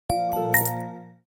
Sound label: purchase, Nokia 808 PureView